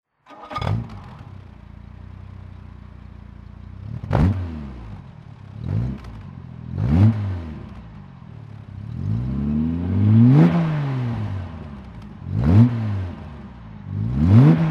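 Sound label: jaguar etype 38ots throttle, Engine sound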